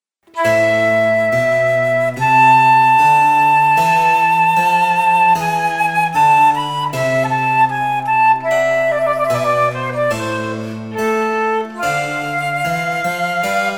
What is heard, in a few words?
Flute; C3 Flute